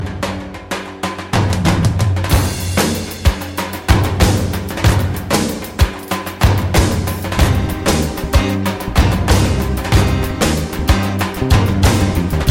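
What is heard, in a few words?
Bulgarian wedding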